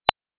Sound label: tock; MeasuringSoundLatency